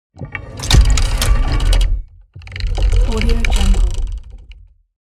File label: object mechanical mechanics large rattle trail